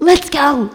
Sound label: happy